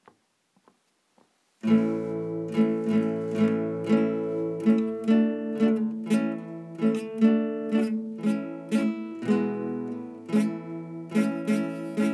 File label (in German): Gitarre; Angela